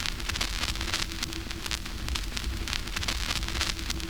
CRACK, CRACKL FX

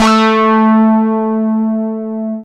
TECHNO; E-Lab; X Static Goldmine 1

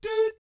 DOOT A4, Vocal Jazz Fx; Spectrasonics; Vocal Planet Vol.3 Jazz Fx

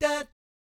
DAT D4, Vocal Planet Jazz Fx JAZZ COMBO; Spectrasonics; Vocal Planet Vol.3 Jazz Fx

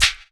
SNARE; E-Lab; X Static Goldmine 2; akai

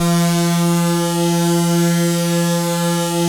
ATMO PADS; Best Service; XX Large

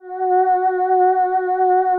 ATMO PADS; Best Service; XX Large - Pads

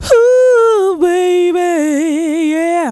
VOX

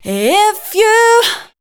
voice spectral, ly short

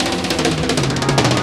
DRMROLL; E-Lab; X Static Goldmine 2; akai

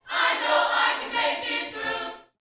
Vocal Gospel PHRASE

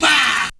VOX

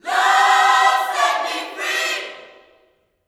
Vocal Gospel MULTI PHRS; LUV FREE; Spectrasonics; Vocal Planet Vol.1 Gospel

